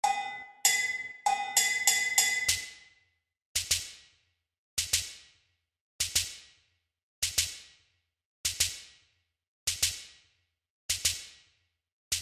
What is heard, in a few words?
chekere; Practice in 6/8; Beaded gourd instrument originally played in sets of three or more and divided into parts; Laurent Lamy